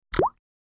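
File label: Drip Single Falls Liquid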